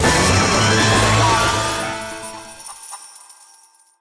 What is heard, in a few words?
large Win; eggomatic